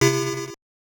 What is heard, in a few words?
Jingle